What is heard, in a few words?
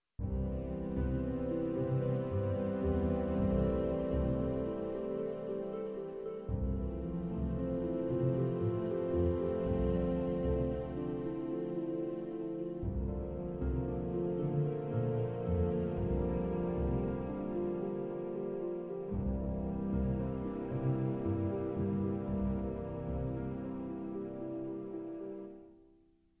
melody groups violins winds backing wet